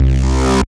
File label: C Bass